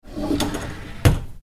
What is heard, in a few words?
Kitchen Drawer Close